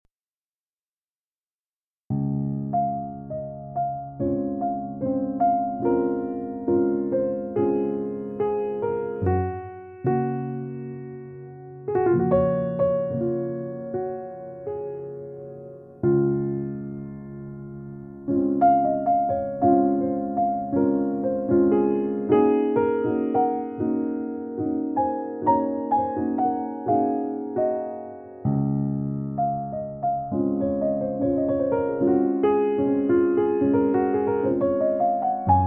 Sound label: Relaxing Jazz Piano Mood